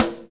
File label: snare